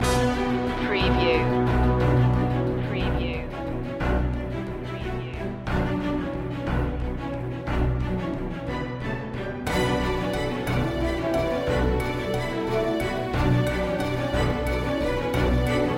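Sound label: no choir no percussion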